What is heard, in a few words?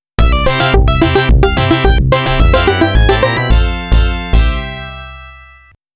Sound Logo without Voice; snk arcade sound digital collection vol. 01